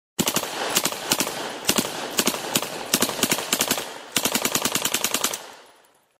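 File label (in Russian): pulemet, machine gun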